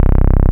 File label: Bass Shot C